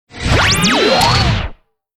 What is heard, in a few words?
IMPACT COMBO